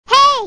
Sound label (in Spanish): pequeno grito de nina